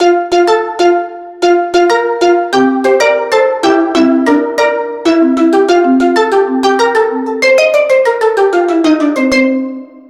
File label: Keys Beach, ringtones; Gigaset; GS290